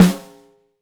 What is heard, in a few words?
Snare, Roland TD-20